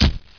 pl pain; mistake coop; player

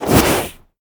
empty; svencoop; ins2; ftm2

